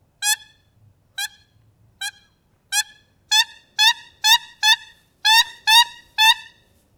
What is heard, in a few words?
Contact Siberian Pair